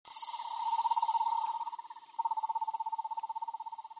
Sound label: Aardvark Grunt Ringtone